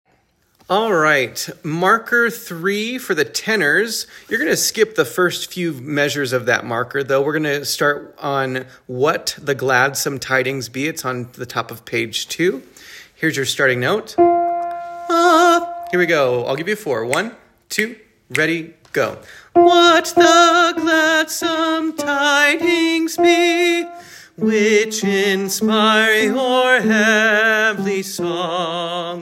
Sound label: Tenor, choir